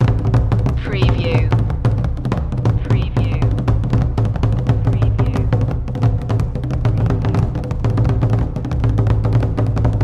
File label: african drums tembea loop